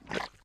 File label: mud, footsteps walk; Left 4 Dead 2; boomer